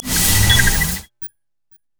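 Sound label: concussion grenade; LoL; heimerdinger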